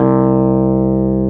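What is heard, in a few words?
Wurlitzer C6, Keyboards Old School; USB Soundscan vol.09 - Keyboards Old School; AKAI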